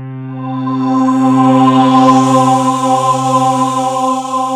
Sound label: JV vectors, Roland JV80; Rubber Chicken Software Sample Bank